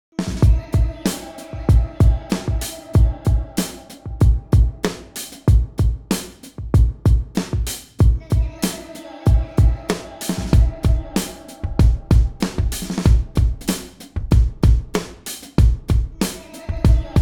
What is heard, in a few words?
DRUM